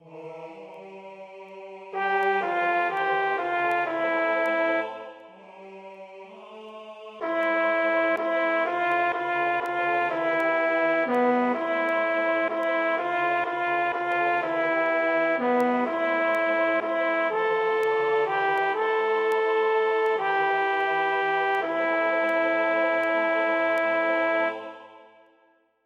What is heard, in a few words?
Responses alt